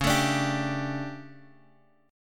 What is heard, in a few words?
D Major 7th Suspended 4th, chord